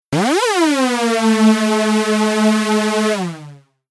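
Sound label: synth mod pitch envelope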